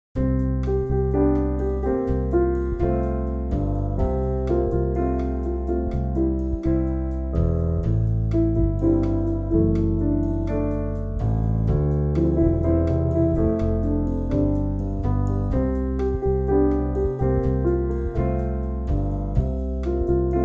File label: With Trio; 60sec